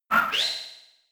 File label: Swipe; False Swipe